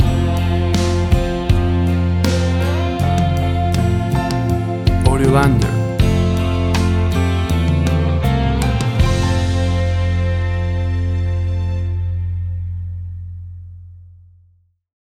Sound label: Country Ballad Romance Music Theme